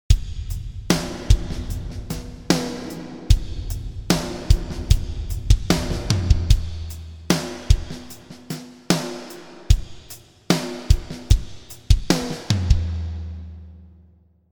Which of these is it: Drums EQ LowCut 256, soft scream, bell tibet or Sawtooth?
Drums EQ LowCut 256